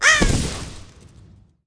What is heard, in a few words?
Druid of the talon death alternate